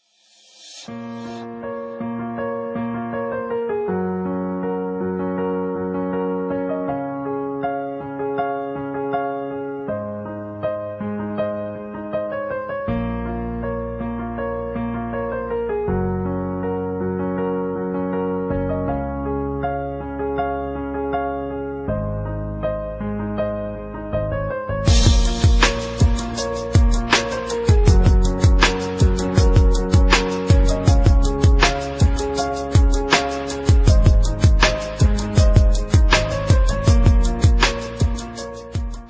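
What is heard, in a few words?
instrumental love beat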